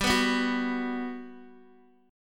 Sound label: G Major 7th, Guitar, Open D